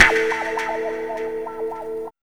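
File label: guitar chord; E-Lab; X Static Goldmine 1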